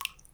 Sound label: lock; Lumia 1520 South Africa